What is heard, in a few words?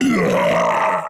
Brute Death